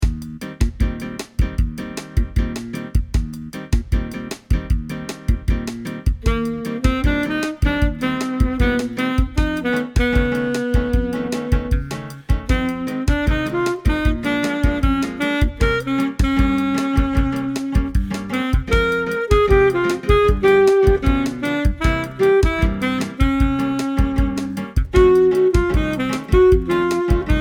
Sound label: sax sax